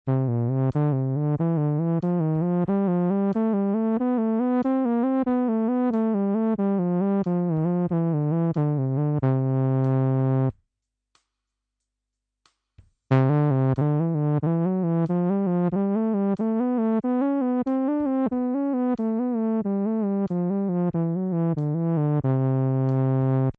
EWI

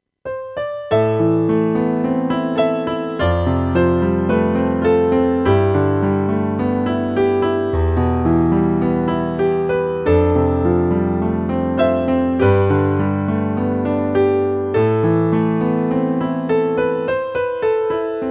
piano

